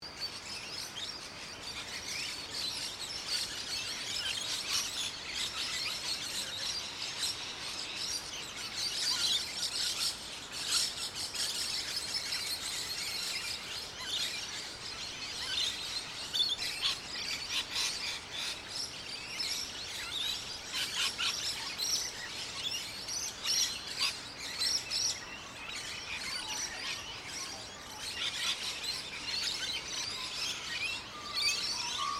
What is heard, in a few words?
Ambience Australian Sunrise Noisy Birds Loop Surround Rear LR, Morning Ambience; Morning Ambience in suburban Melbourne; Outdoors; SoundEffects-Foundation1